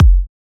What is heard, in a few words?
Kick; Ultimate Kick Collection